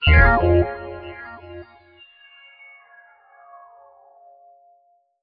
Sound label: Tech hit